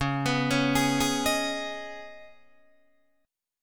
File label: Db 7th Suspended 2nd, Guitar, Open D